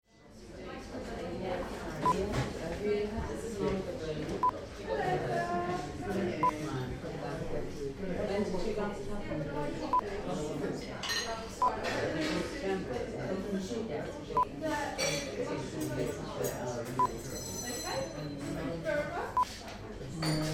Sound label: Small Cafe Ambience